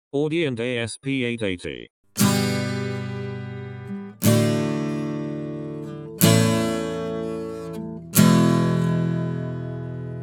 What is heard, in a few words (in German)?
Audient ASP 880 Western Gitarre Vergleich Pro40 ASP880